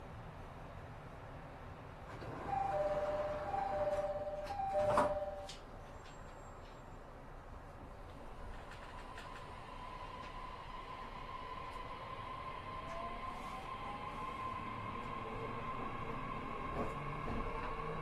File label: joban mito E501